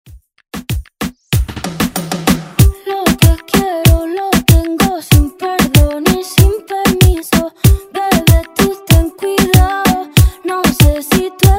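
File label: Latin Reggaeton Redrum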